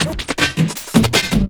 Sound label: LOOP L, Drum & Bass 160 BPM; Ueberschall; Drum & Bass Flash Trackz